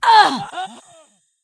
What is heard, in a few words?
Wound Crit; Keristrasza